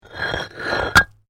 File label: PAVING STONE FALL